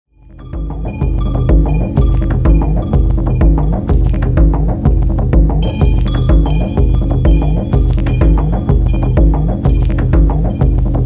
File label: Bembe Bongo 808 Kick